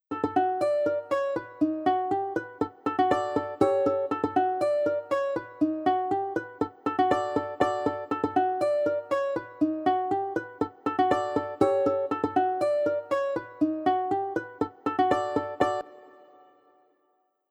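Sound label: backing track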